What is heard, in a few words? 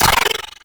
Sniper Rifle Layer; Wraith Grineer Sniper Rifle Layer 2D; Warframe; Weapons; Grn Female Sniper Rifle